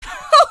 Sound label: impact; episodic; episode 1; Source engine